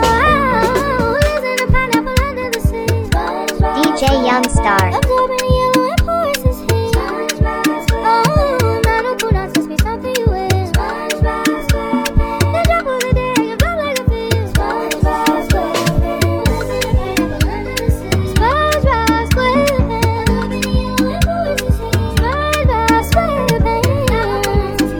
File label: Speed-Up TikTok Afrobeat Version